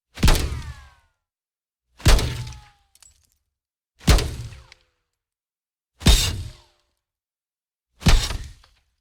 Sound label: bullet hit car